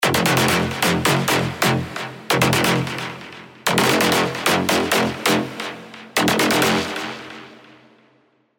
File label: Stab; Stab Firepower; Revealed Serum 2 Big Room Techno Drops Vol. 4